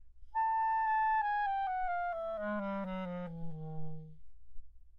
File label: chromatic scale